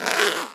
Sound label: Zipper Zip